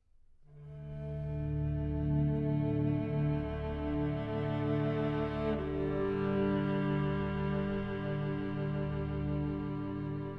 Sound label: No Vocals; Assassin's Creed Syndicate